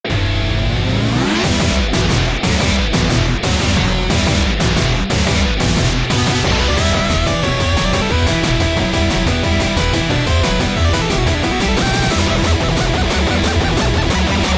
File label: guitar louder; Earthnight